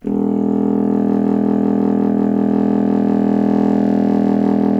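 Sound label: wind contrabassoon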